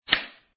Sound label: audio card out; mahjong ningxia